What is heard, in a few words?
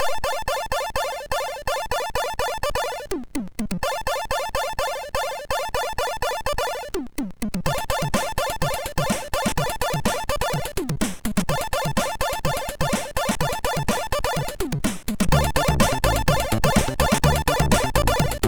techno, MOS6581R2